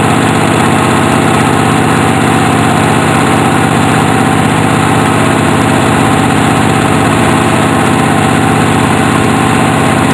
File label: Big Diesel Motor; Soundsation